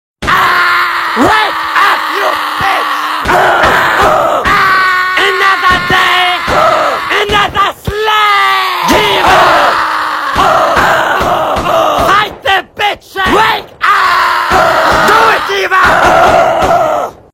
wecker

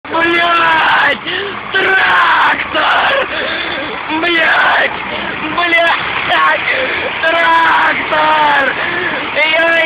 traktorr

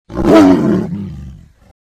fauchen laut

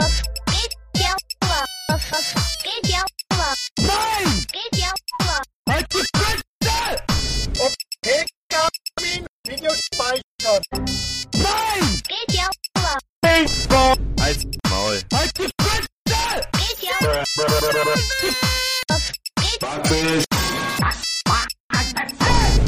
nokia klingelton remix

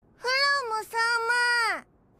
frosch

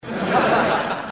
lachen kurz

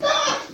schrei

